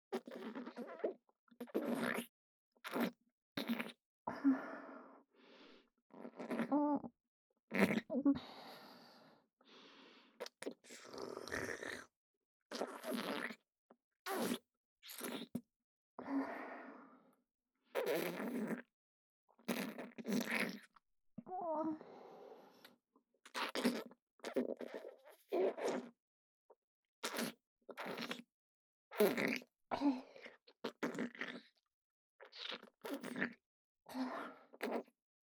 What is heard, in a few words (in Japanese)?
ダウナー ねっとり口フェラ